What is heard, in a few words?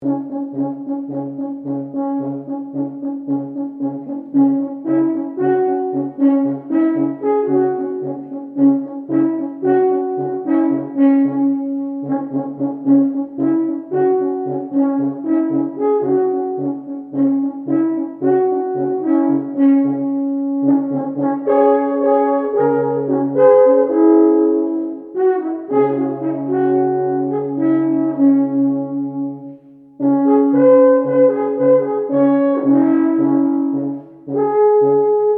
Mordern Pop Rock